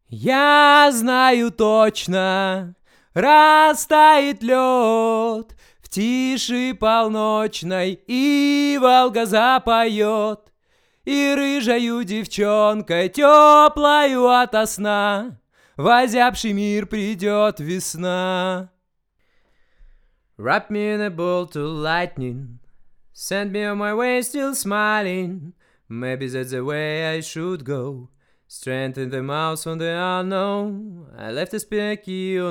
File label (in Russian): vokal